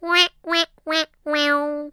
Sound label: wahwahwah